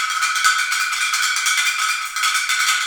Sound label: ROT DRMS, ROTATE DR; Spectrasonics; Heart of Africa 1